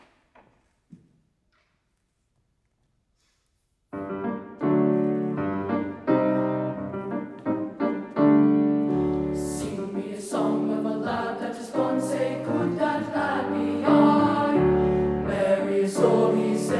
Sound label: tenor bass